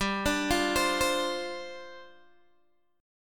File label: G 7th Suspended 4th G down Guitar Open G